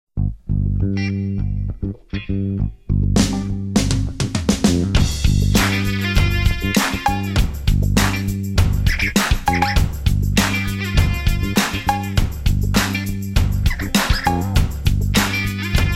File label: recorder rap